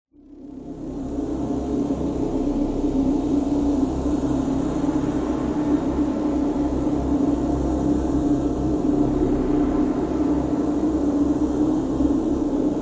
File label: amb special; Resurgence